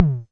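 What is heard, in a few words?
mattel tom, Mattel Synsonics drum kit, lo fi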